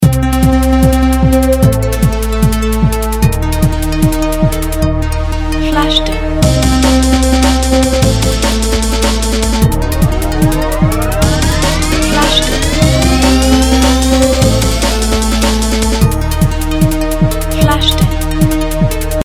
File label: ignition; scottwills